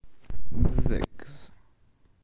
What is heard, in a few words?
Husky